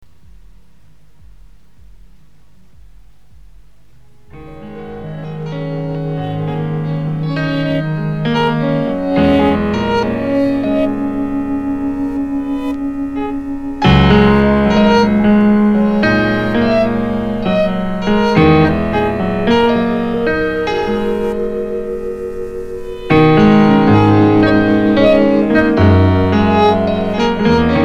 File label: piano